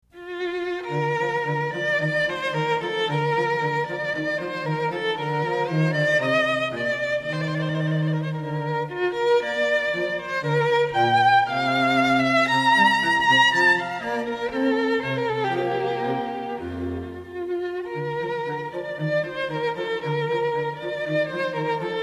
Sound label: String Duo